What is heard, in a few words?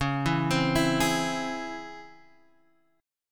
C sharp Minor Major 7th C sharp Guitar Standard; C#mM7 arpeggiated; x,4,2,5,5,4; JGuitar